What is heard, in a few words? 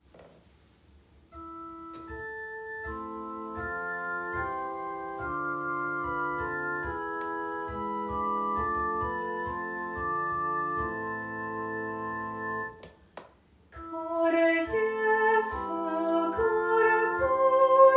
soprano, cor jesu